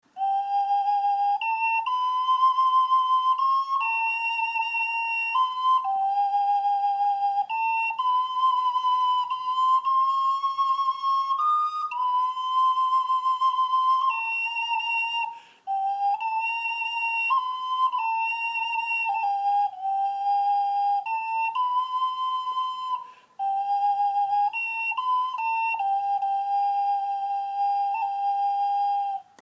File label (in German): Kinderflote Chromatisch